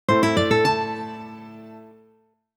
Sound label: Jingle Treasure Chest